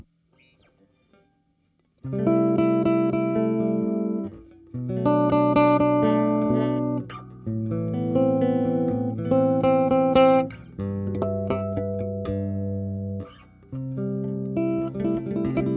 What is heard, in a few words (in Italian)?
arpeggiando